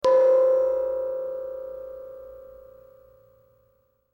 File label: hang do